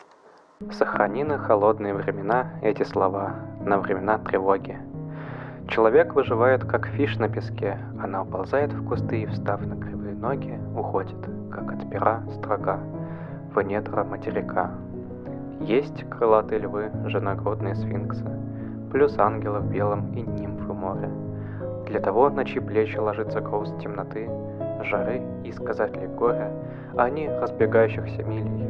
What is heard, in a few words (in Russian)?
s muzykoy